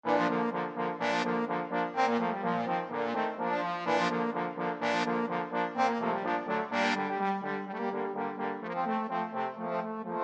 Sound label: trombone quartet